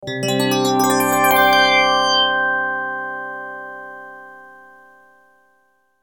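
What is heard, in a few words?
Musical Element, Drum Snare March; Blastwave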